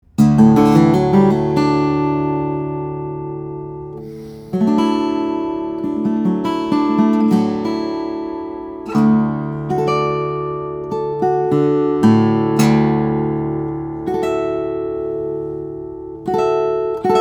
Parlor, steel-string guitars; brazilian rosewood; sitka spruce; flattop; klein kauffman

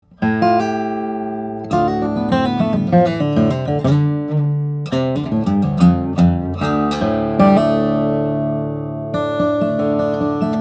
guitars; Wilborn Nautilus; brazilian rosewood; german spruce; flattop

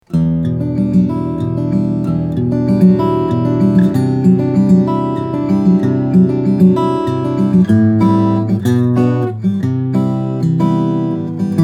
steel-string guitar, flattop; baranik jx

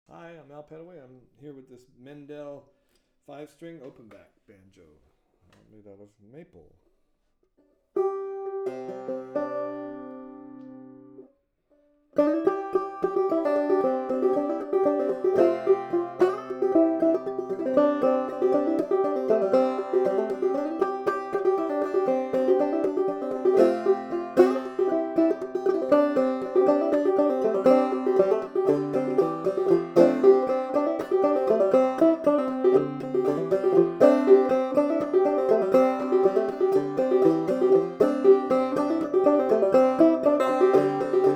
mendel banjo; banjos; open back

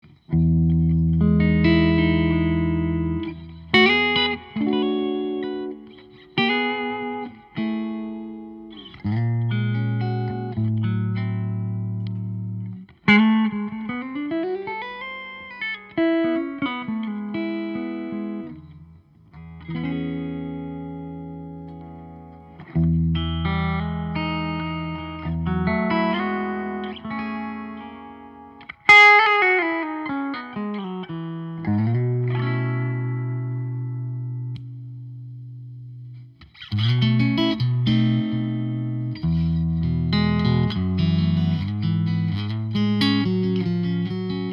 PRS Archtop clean, guitars; prs hollowbody iv; maple spruce; electric

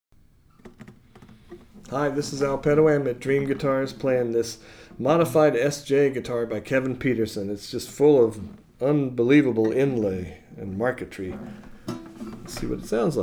steel string guitars, flattop; pederson custom guitars formerly abyss guitar co sj

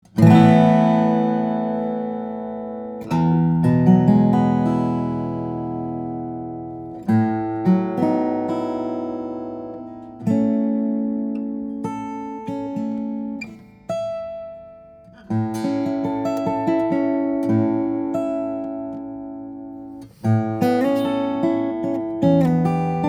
steel string flattop guitar; Ryan Paradiso; brazilian rosewood; cedar

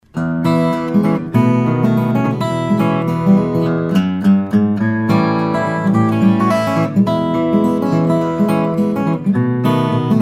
14 fret noncutaway, steel string guitars flattop; zimnicki

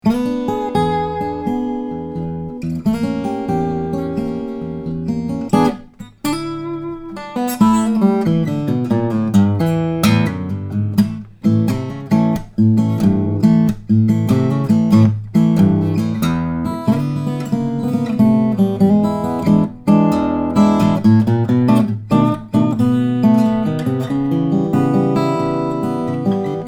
steel string guitar; running dog guitars ought-3; rick davis

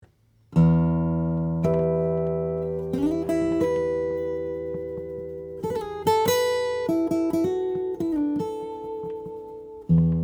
V-OM1000NSX, steel string guitar; rainsong; carbon fiber hybrid; spruce

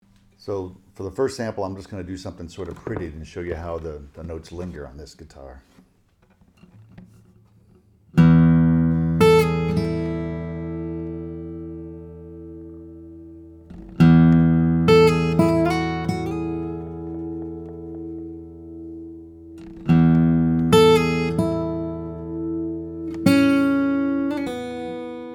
Shanti SC, Maple/German Spruce; steel-string guitars; flattop

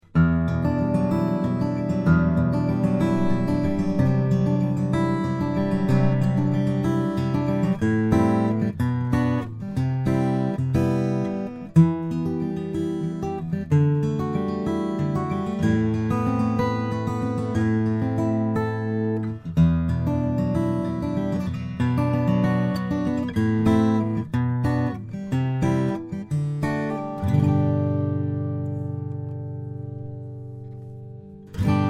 steel string guitars, flattop; galloup big mitten

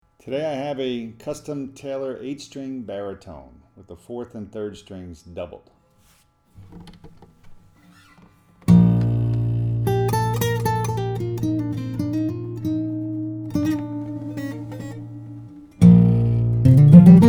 taylor baritone, steel string guitars; bt8; flattop

